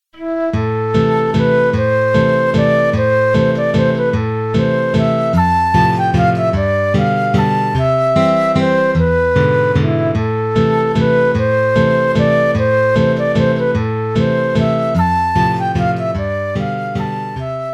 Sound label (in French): Valse, Suede